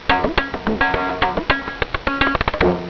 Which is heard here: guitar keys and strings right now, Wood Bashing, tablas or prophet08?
tablas